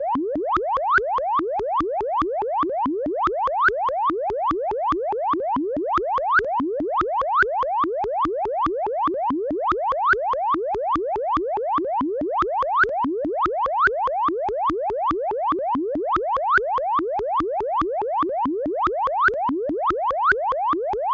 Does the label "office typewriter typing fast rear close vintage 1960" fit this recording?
No